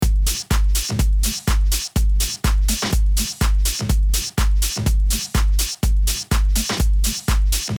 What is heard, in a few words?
unbypassed with compression saturation